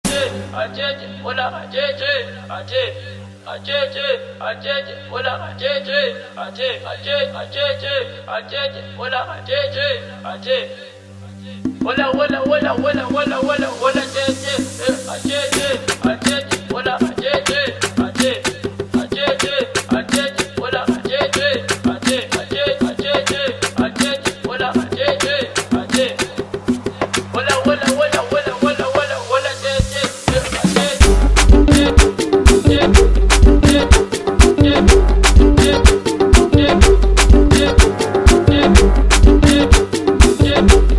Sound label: Afro House remix